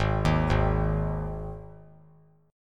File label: G sharp 5th; arpeggiated; x,3,6,6; 3C,3F,3A,3D; JGuitar